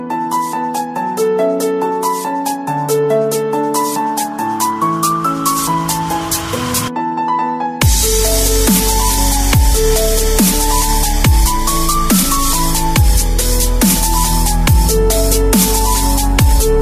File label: ringtone